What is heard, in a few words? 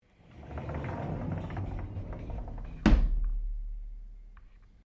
Door Sliding Interior Aluminium Glass Close; Various doors